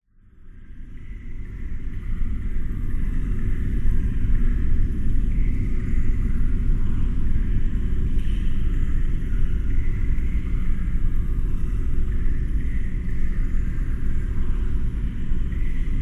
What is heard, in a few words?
horror dungeon amb dark eerie water trickle drips